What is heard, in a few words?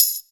Tamb; PLUTO KIT